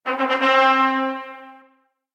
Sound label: Royal Fanfare, Classical Harmonies, notifications; Google Pixel 4